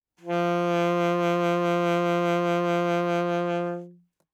Alto Sax vib ff F3, Eb Alto Saxophone; Woodwinds; MIS Pitches - 2014

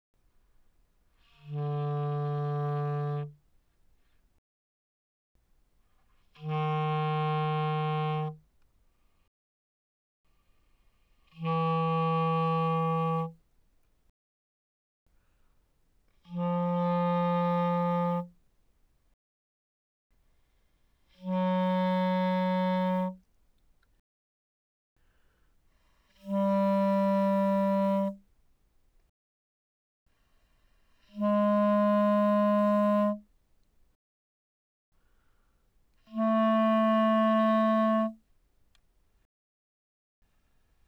Bb Clar mf D3 B3, Bb clarinet, woodwinds

